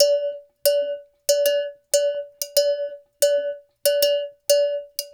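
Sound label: 93 bpm, Timbale Bash; Spectrasonics; Supreme Beats; African Contemporary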